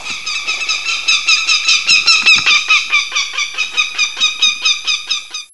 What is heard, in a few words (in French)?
autour cri